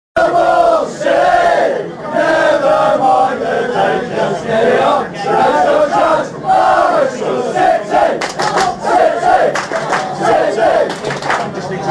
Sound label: bit of pub atmosphere